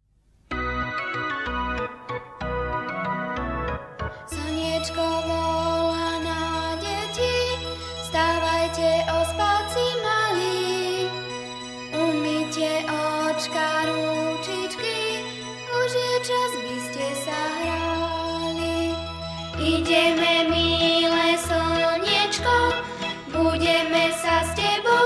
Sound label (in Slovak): Piesne Pre Deti; Slovak